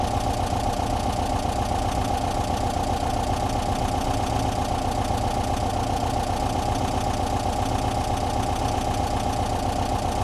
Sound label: Solid Lifters Warm, Engine Sounds